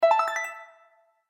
Bright Sound Video Game

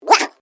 Created Creature Small Yuck, Alien Sound Effects; Created creatures, vocalisations and pretend speech

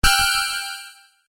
UI Deny, User interface feedback sounds; Ui Set B; Computers; Electronics

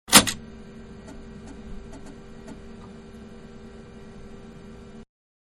Loading Floppy Disc, Computer; Electronics; Computer-Loading Floppy Disc; Computers

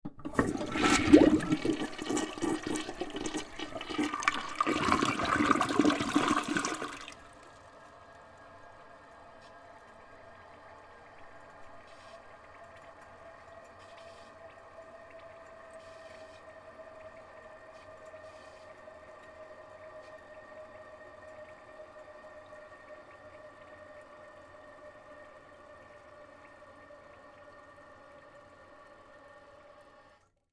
Household Toilet Bathroom Flush Bowl Perspective; Blastwave

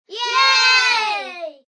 Kids Group Yay, Group of children; Talking Sound Effects; Sound Effects:Voice; VoiceBox

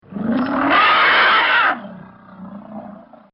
Elephant Angry; Elephants; Animals; Bobby Cole

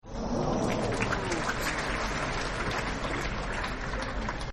Applause Ah Oh; Human; Partners In Rhyme

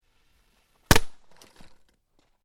FIREWORKS CRACKER SMALL SINGLE METAL PIPE; Variety of fireworks being detonated

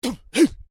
Male Vocalization "Hun Cha"; Male vocal sounds; Exclamations; Voice

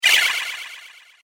Star Fire Spell, magic sound effect; Magic Sound Effects; fantasy sound effect; Andrew Fleming

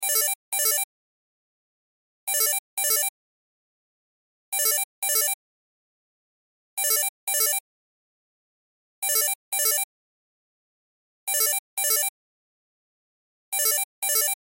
Cell Ringtone; Loop; Cell Phones; Blastwave

